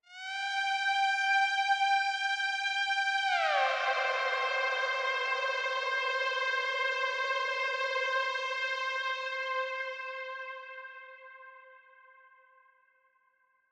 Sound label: Lead Synth; Martian Chess